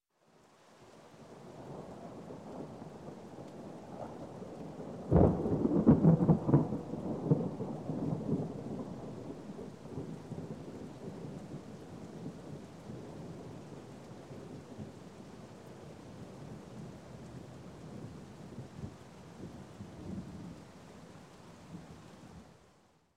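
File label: Thunder Long Rumble, Rain In Building; Sound Ideas 2000 Series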